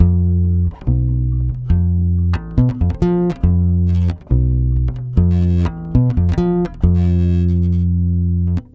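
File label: 140 BASS F DIM, Brazil Bossa; USB Soundscan; AKAI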